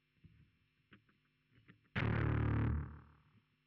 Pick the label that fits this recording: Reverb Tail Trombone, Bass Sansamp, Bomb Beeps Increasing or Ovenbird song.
Bass Sansamp